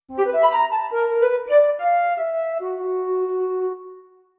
flute

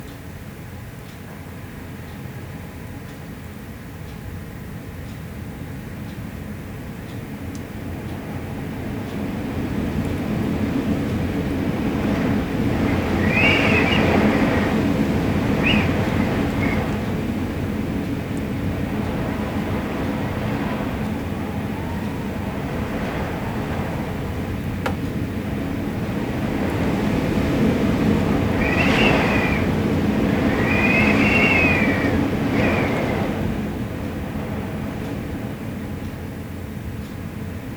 Wind On Door Short; Benboncan

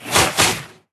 Attack; Barbarians Nanoq

